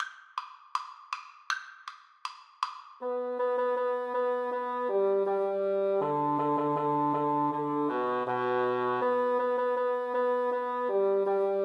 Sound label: duos marcia, Bassoon Duet